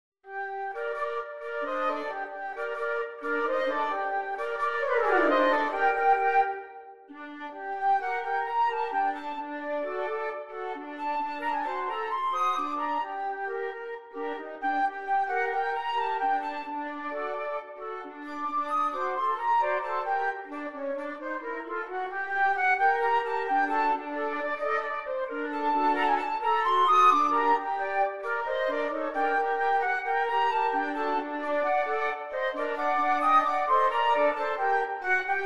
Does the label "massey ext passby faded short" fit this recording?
No